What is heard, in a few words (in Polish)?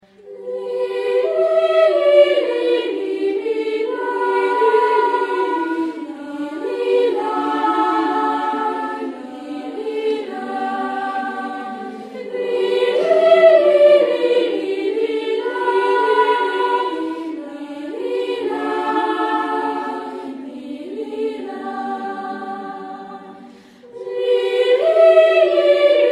dzwonek